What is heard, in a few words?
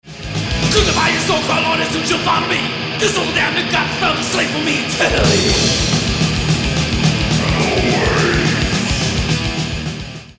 fast singing